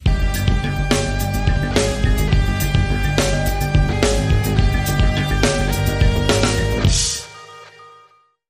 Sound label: Link Sting